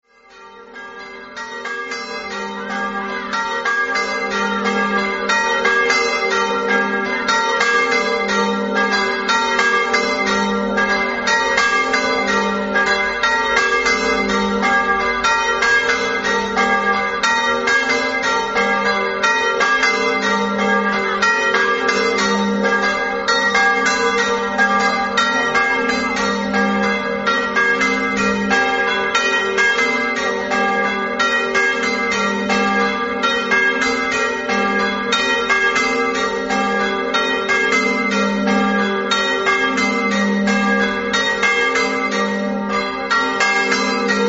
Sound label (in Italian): campane